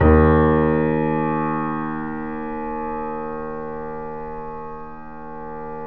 3D Pianos Yamaha C7; E-MU Producer Series Vol. 5

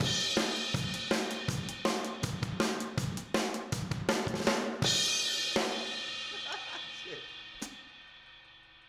Outro Room M, 162 BPM, Drums

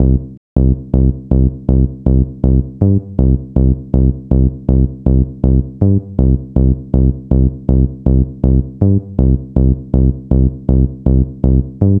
gro 160, Hardcore Loops, Bass Loops; Dance Synths

